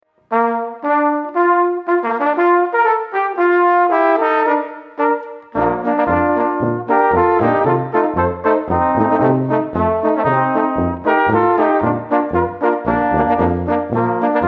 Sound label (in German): Marsch